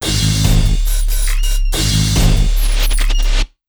Big Beat Drumloops, 140; USB Soundscan vol.25; AKAI; TECH 140

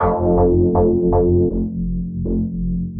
Highlow Bass; Sonic Foundry (Sony Creative Software); Knutrix Drum & Bass

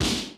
SIMMONS CRASH; Hollywood Edge; The Big Bad Beatmachine

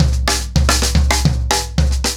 110BPM, SNARE TOM SOLOS; Sonokinetic - The Roots Drumloops; ACOUSTIC DRUMS

